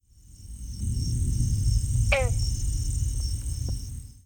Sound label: bronze frog